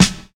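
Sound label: prominent snare drum b key, acoustic snare tuned to the B note; snare drum samples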